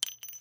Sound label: drop